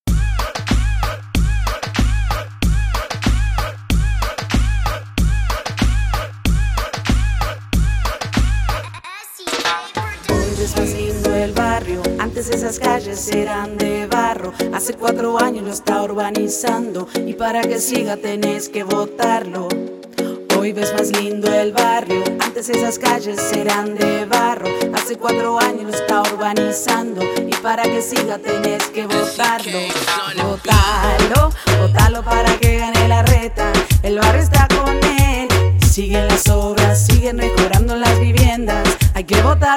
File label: Jingle; Larreta